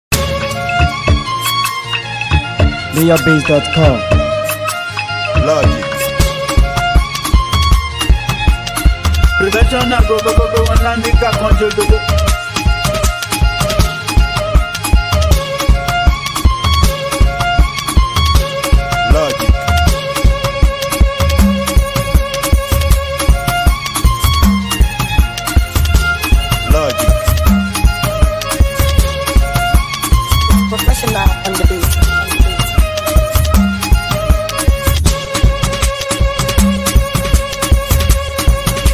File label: Professional Beat